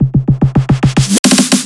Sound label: 145 BPM drum fill; Singomakers; Infected Psytrance